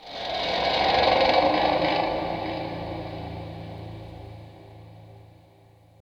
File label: GTR, E.Guitar FX